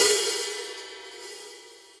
HIHAT open side

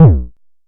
analog quick, kick; Ableton Foundation FreePacks

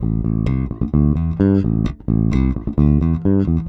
130 Old School Bass A, E-Bass; Pop Rock

